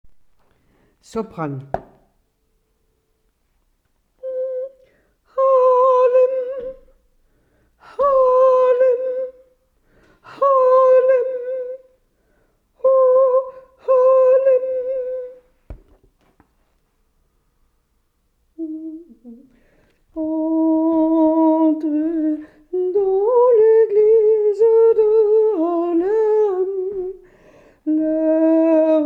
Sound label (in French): Voix Sopranes